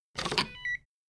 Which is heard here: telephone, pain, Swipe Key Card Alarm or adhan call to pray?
Swipe Key Card Alarm